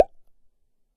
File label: mouth pop